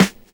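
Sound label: snare drum 70s; E-Lab; X Static Goldmine 2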